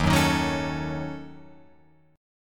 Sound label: D sharp Minor 7th Flat 5th, Guitar, Open F; strummed